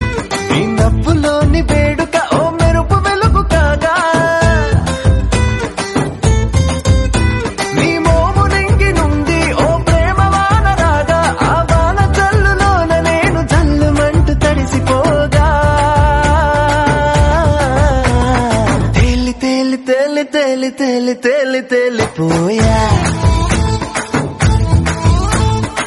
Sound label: telugu romantic